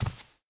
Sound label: rug walk, step; blocks